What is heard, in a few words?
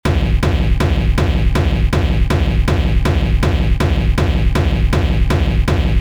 bad dragster, hard techno kick; hard techno kick generator